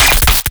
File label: spark short